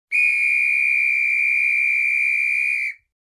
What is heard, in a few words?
Traffic Officer Whistle Blowing Long